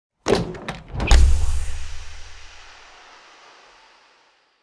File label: Sci-fi hydraulic door opening or closing